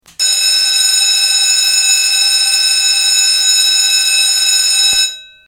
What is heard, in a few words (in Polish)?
ton